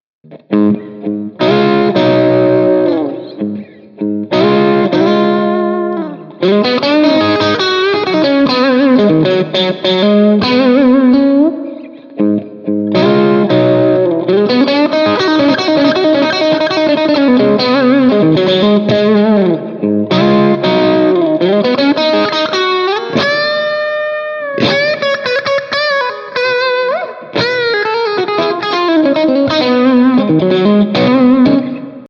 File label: Bridge middle dirt, pickups